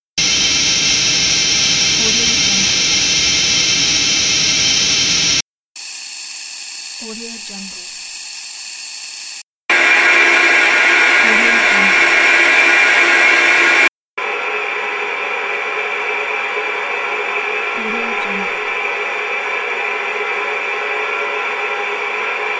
airliner swooshy background; livingroomclassic

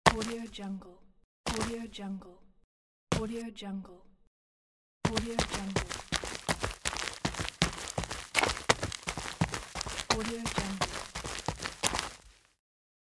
footsteps running on dirt

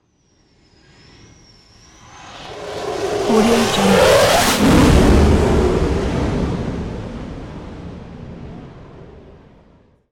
fantail prowler jet arrested landing; sound ideas

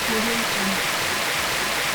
scifi small air circulation system fan loop

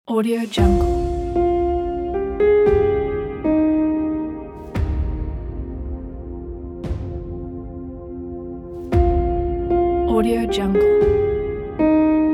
documentary piano

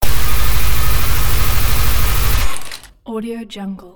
future weapons submachine gun burst; future weapons 3; soundmorph

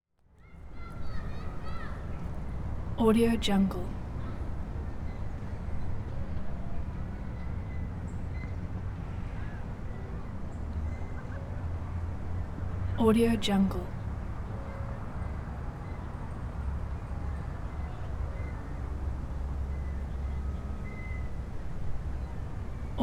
kids playing fort greene park midafternoon

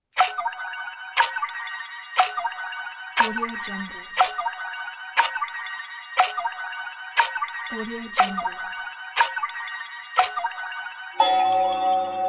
christmas clock tick

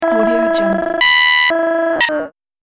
modern glitch component

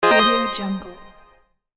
menu operation app select tap

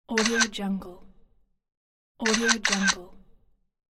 camera; medusamusiclab

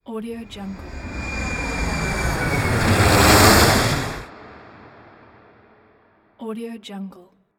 plane

